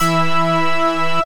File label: synth pick; Nokia; samples; Beatnik; Originals 2